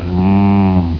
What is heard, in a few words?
mmmm